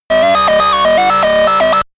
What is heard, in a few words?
game arcade race